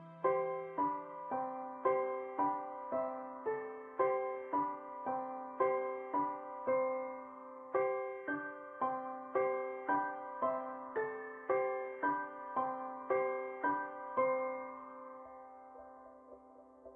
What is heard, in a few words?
bgm Part